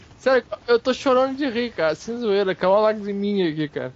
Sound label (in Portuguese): chorando de rir